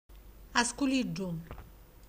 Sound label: piccolo pezzo; ruffano